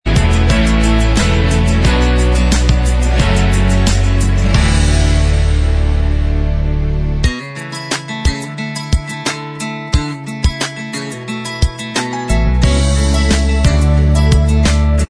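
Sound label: instrumental, backing track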